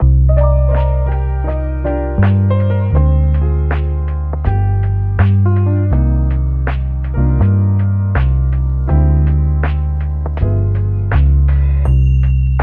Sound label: Slow Too Slow, lofi piano